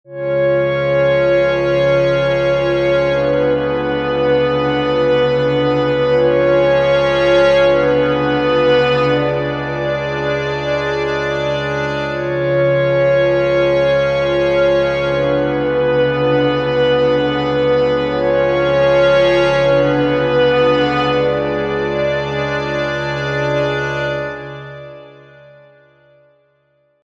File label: pad; fire by music sound software